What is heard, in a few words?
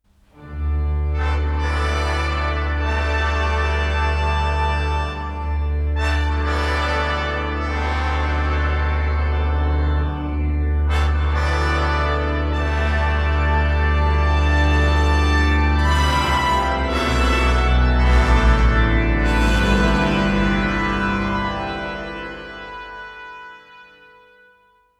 prelude